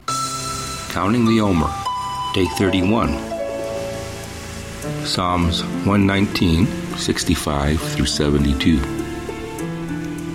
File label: Meditative w Waves Ocean; Omer; Psa119